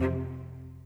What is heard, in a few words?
Cello, Orchestral; Sonic Foundry (Sony Creative Software); Orchestral VOL-2